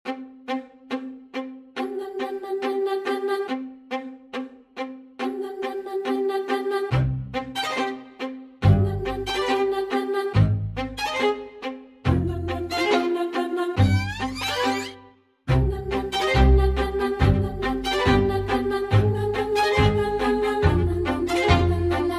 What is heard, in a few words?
Strings and Vox Only